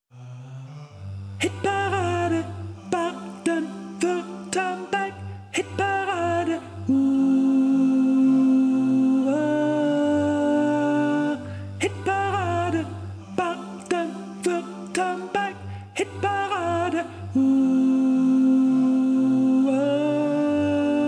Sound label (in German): Jingle; SWR1 Hitparade